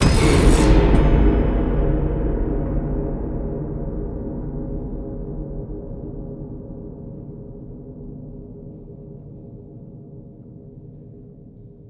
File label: STING Hard Strum Slammer; Cinematic Metal Impacts